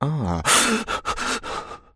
Surprise Breath

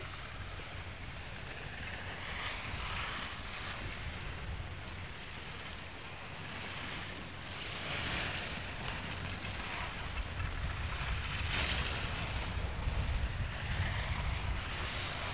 Ski And Snowboard

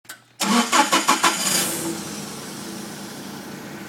Forklift Starting

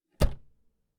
Closing A Car Door

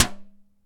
Aluminum

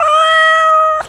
Cat Meow

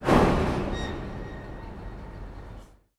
Horror Train Effect

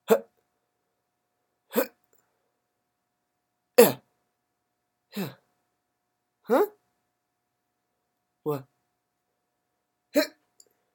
Grunts

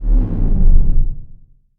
Power Down

